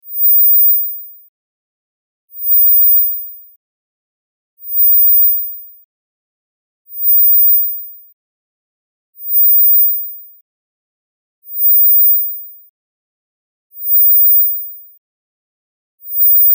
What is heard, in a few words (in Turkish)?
gizli zil sesi; Melodiyi yaşlı kulaklar duymayacaktır